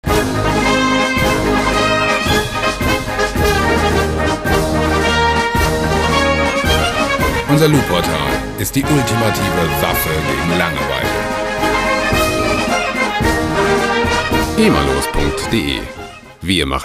bayerischer defiliermarsch

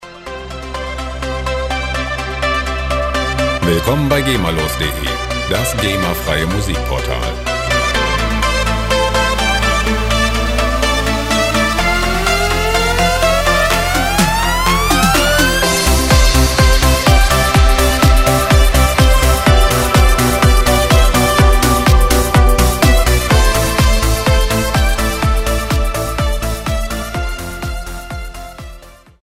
schlager zirkus

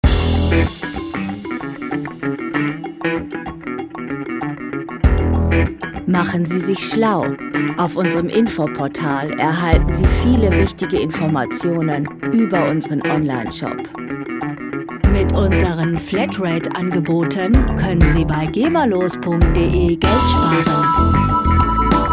jamsession

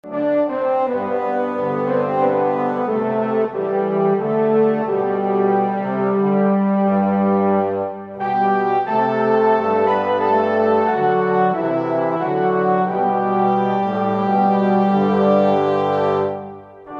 posaunenchor

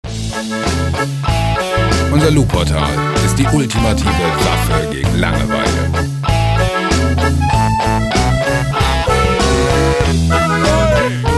alpenrock

